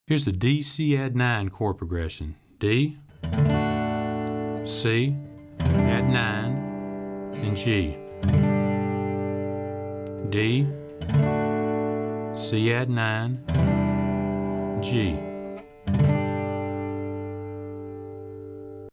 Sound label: Chords